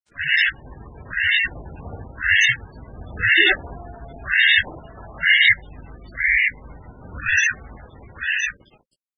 karugamo

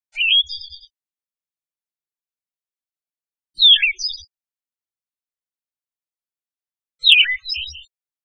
mamijiro; キョッ／シー／キョロンチー（さえずり）／（キロイッー）／中部山岳などの森林に棲息，普通・夏鳥，23.5p，雌雄異色

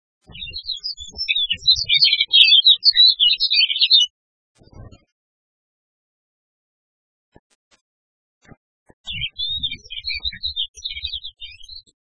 iwahibari

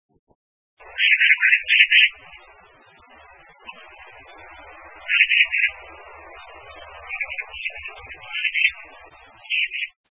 kasasagi

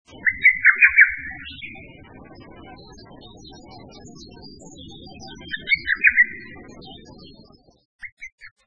hototogisu

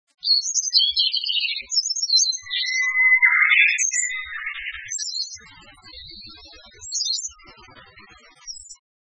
kosamebitaki

